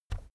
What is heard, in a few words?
keyboard external key push soft